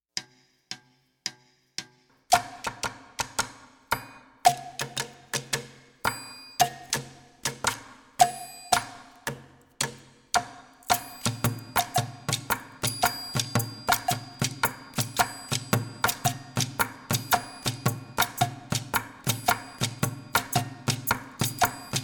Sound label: Student Percussion Slow